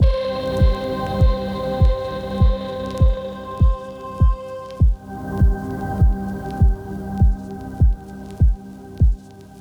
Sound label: Neat Kicked Loop; BB 2HTC Samples Vol 4